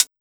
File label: closed hi hat sample B key; hat samples